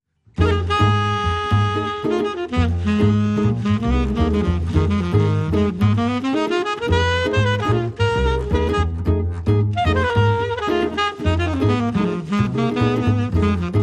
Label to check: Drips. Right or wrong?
Wrong